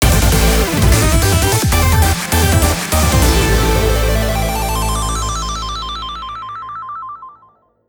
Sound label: Battle Ended (Victory BGM); Gunvolt Chronicles: Luminous Avenger iX